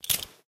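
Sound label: inventory items grenade; STALKER; IWP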